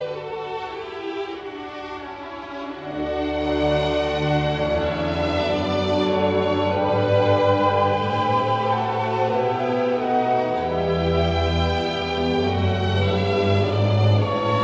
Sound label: Clarinet Concerto In A Major